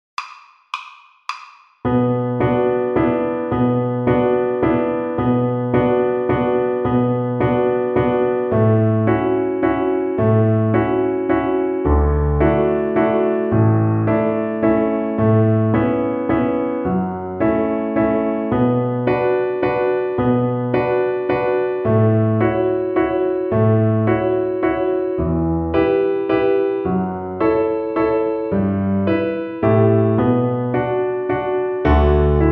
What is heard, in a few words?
String Gym, Piano Accompaniments